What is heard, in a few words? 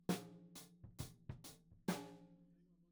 Tom